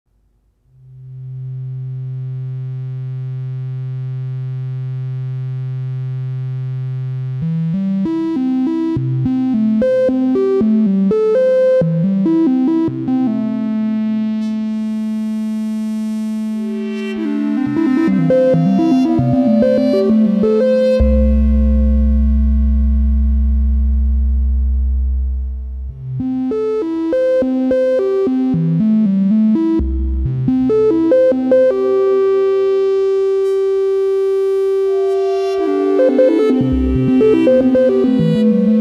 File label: Modular One Number One